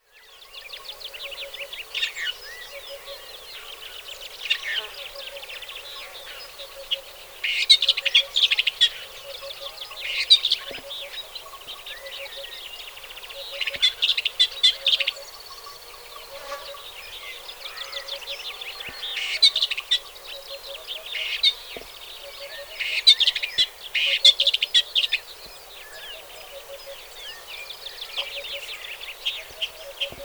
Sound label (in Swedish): Rödhuvad törnskata